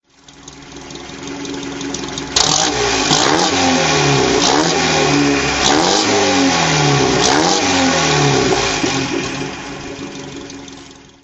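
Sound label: MG TD mk2 throttle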